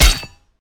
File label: basic hit; smite; osiris